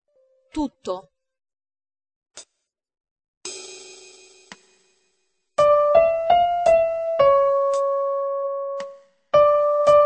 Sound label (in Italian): terzine 4-4 Dm